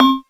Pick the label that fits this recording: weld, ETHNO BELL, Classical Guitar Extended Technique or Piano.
ETHNO BELL